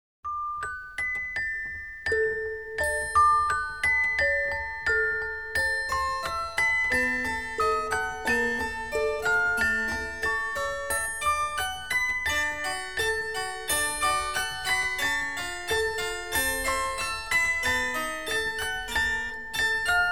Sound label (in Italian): versione clavicembalo e celesta